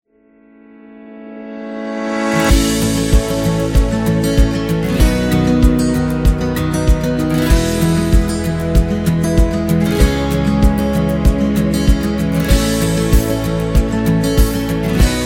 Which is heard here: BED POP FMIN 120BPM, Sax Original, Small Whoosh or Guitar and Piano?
Guitar and Piano